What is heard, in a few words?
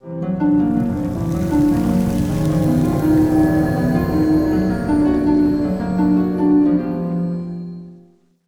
battle harp long; macha